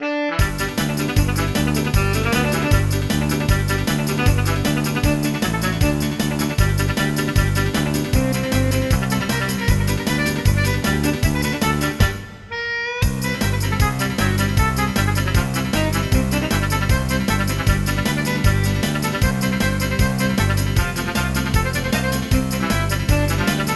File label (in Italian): sottofondo